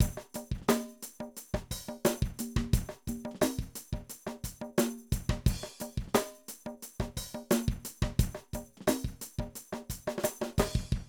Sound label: Drum loops, 70s funk; Zero-G Groove Construction (1993)